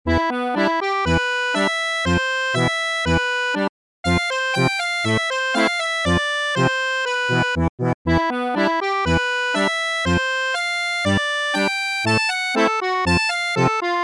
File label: Polka; Sjostakowitsj